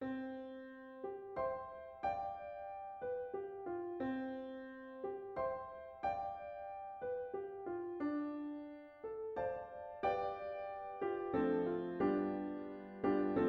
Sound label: piano; The Owl And The Pussy Cat